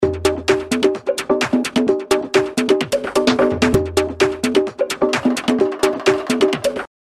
TRIBAL PERC

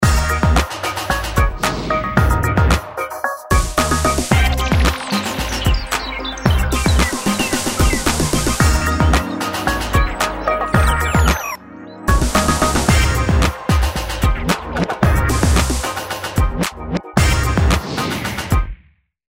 GENERIC ELECTRO BLUE

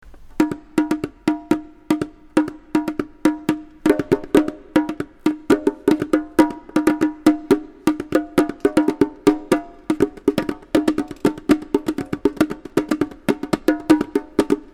CONGAS

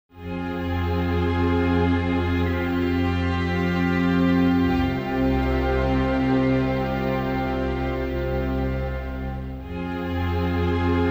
SAD NEWS

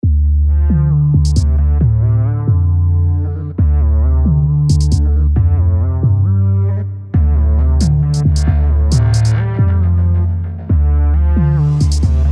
RELAXATION GROOVE

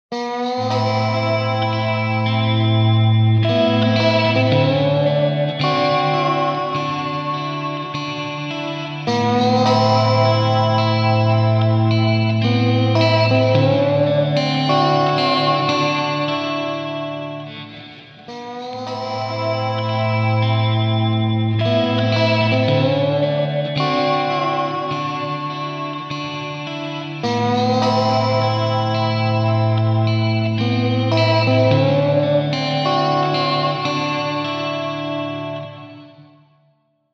guitar slide

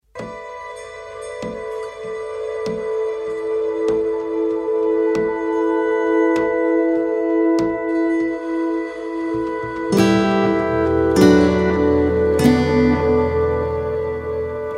ROUGH STRINGS

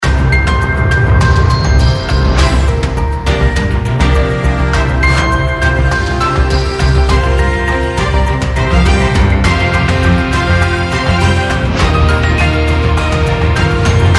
GENERIC RETRO

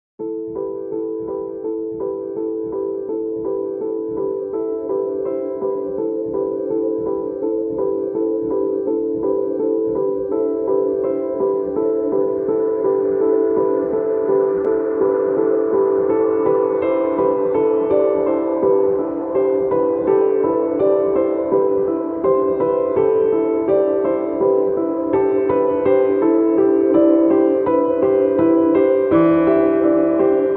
STRANGE OCEAN KEYS

